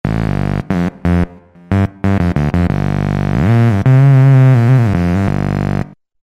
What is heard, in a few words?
Low Box, Roland V-Synth; Factory Patches